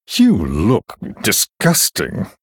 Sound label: Male Human Attack